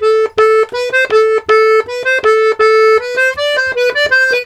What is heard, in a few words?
LARIDE, Accordions; USB Soundscan vol.40; AKAI